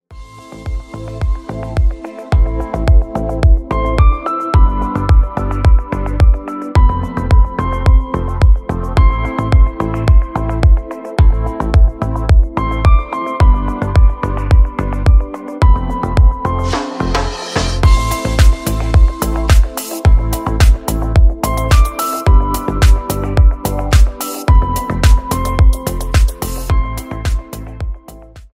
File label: rhythm ringtone